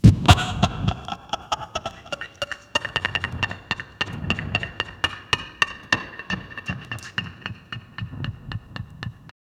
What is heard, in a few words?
rocks smashing with clunk, cave